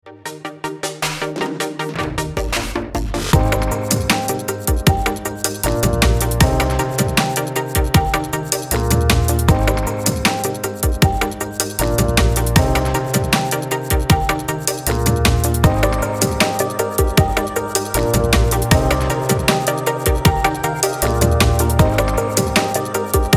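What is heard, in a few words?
Music Toolkit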